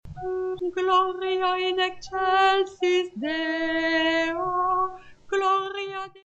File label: Gloria Alto